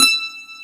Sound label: Mandolin E5; Multisamples; Digital Sound Factory Planet Earth